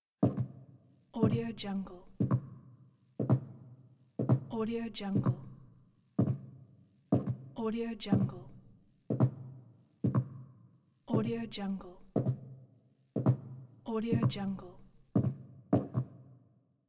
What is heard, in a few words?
male footsteps in hallway; moonaudioproduction